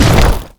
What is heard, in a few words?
Ravager Stomp